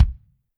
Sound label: KICK, Drums Percussion; Kick 1 ModuIe